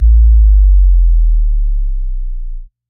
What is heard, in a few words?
One Shot Sub boom